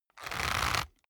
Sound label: leather creak short medium